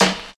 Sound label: Classic Clicky Hip-Hop Snare Sound F# Key